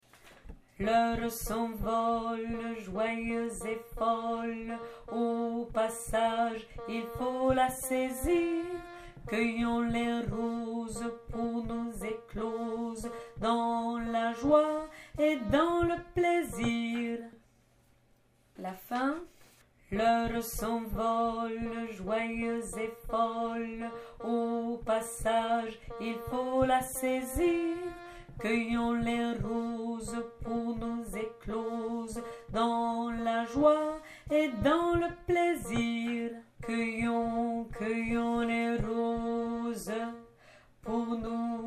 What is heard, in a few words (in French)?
basses; l heure s envole